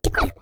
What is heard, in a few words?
speech rodent